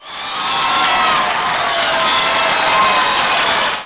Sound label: crowd cheer; Chase Hollywood Stunt Driver; XBOX